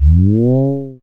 C#, Synclav FM, FX; Big Fish Audio

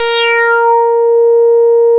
A#5, Bass; CRANDLE; E-MU Producer Series Vol. 6 – Analogia Project (CD 1); Boom da Bass 1

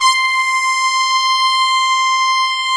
MOOG; USB Soundscan vol.49; Analog Fantasies; AKAI

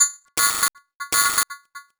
Residual Panned Beat; Sonic Foundry (Sony Creative Software); Loop Noir

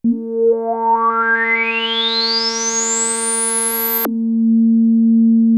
ARP 2600 A3, synthesizer; Hollywood Edge - Giorgio Moroder Rare Synthesizer Collection

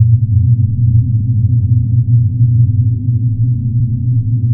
RUMBLER; Spectrasonic Distorted Reality 1

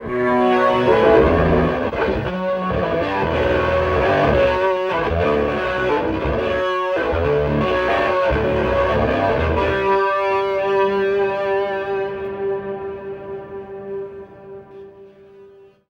Scrum Long, Electric Guitar; Sonic Foundry (Sony Creative Software); Friendly Fire; Wrath

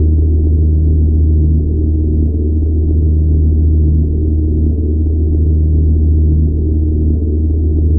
Drone Ambient; Drums & Drones

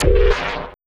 Zappy Fx; Sonic Foundry (Sony Creative Software); Esoterik Beatz

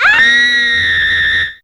Scream A4, Humans; Fairlight CMI IIe

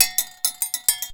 bulu grooves; Best Service; World Colours

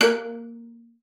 A#3, VIOLA FINGER; Xsample VOL-5

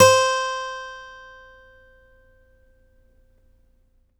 STEEL F C4, GUITARS; Synclavier Sampler Library

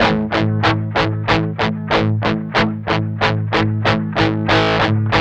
MED ROCK 8TH A#2, Guitars; Spectrasonics; Hans Zimmer Guitars VOL-1; 102-MED ROCK

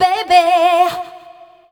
female dance vocals

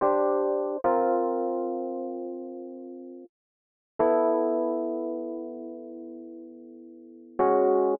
120 rhodes chords C, Keys, Nu Jazz House; PowerFX

